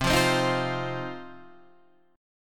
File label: C Minor Double Flat 5th C down Guitar DADGAD